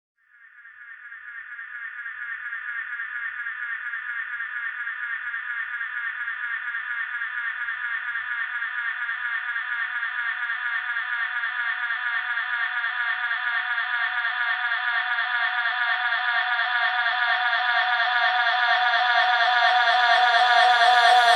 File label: Reverb Athmospheres, FX Vocals; Vengeance Samples